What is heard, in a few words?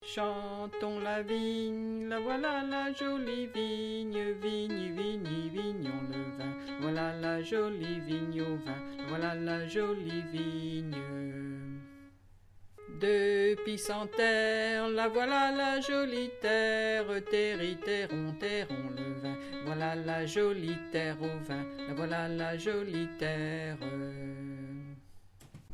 Alto